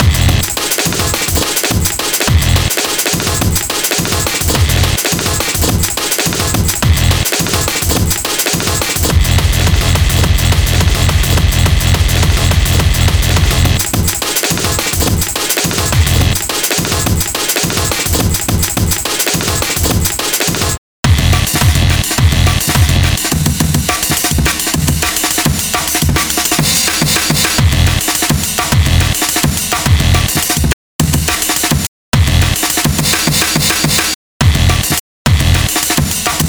A Breakbeat medley

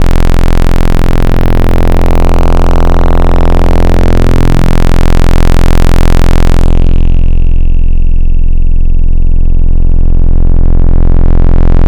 pulse series; Synthetic's Serum Collection; Echo Sound Works - Modular

